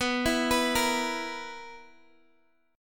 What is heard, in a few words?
B Major 7th Suspended 4th, Guitar DADGAD chord